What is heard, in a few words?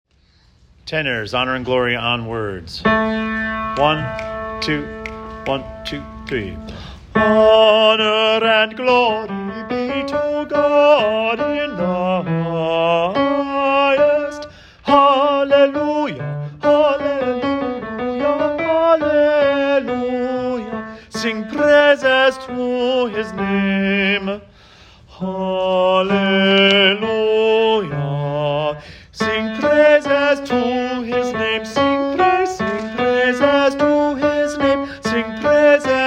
Tenor honor and glory on words; Wando Choir Class Placements